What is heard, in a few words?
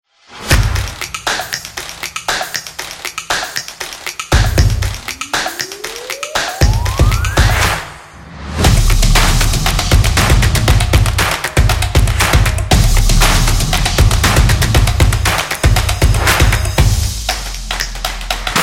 Opener